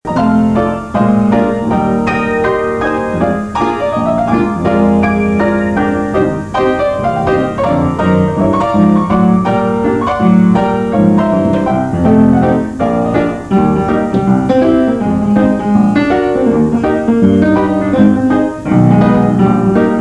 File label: steinway